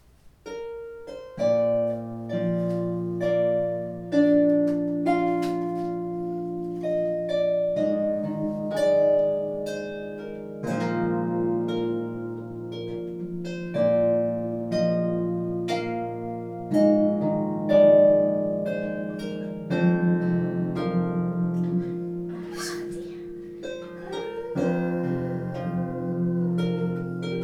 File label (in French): harpe test, Harpe 34 cordes en érable; la harpe étant encore instable elle n'était pas super bien accordée lors de cette prise